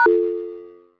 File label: friend logon